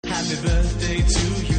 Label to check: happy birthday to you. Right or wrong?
Right